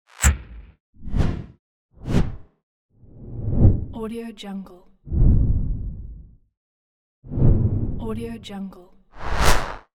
transition

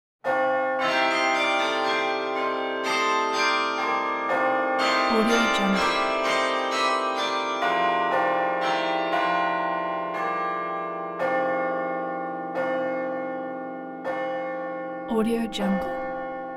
bell tower rings with bright fanfare notes

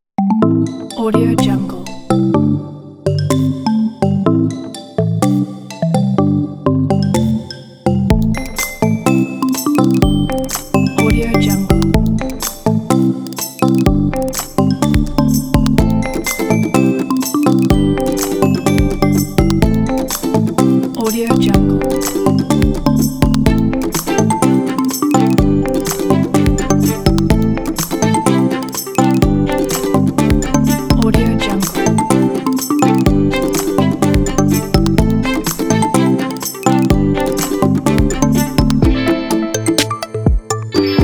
electro happy

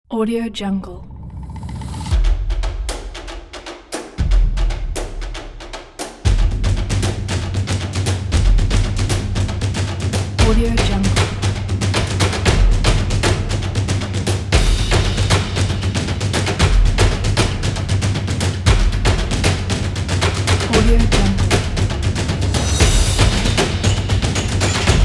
epic drums

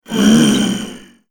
zombie hungry

